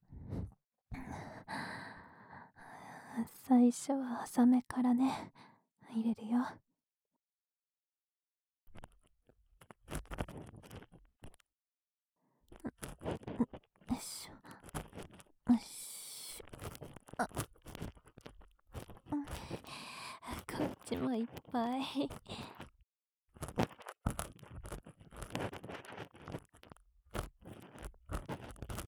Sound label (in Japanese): 【右】浅め耳かき; 耳かき小分けトラック【環境音なし】